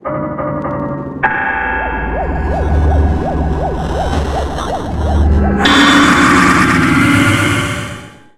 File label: yell